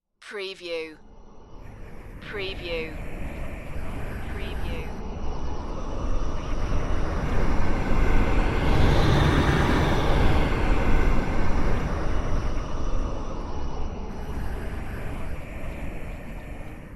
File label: smooth space swooshes